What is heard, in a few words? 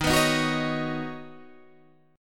D sharp Minor 7th Sharp 5th